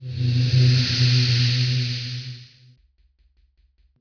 Ghost; ambience

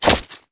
Sword Scale, Damage

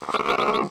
Block Lunker Peace idle

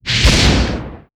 fire piece

